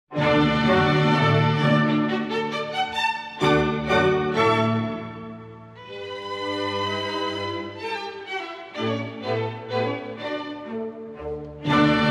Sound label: Symphony No. 35 in D Major, K. 385 I. Allegro con spirito; Haffner; Mozart, Wolfgang Amadeus